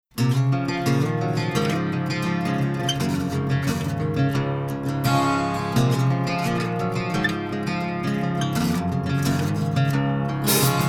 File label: ACOUSTIC; Thought Industry